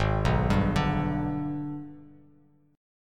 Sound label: Ab Minor Major 7th Flat 5th Ab, Bass Standard; arpeggiated; JGuitar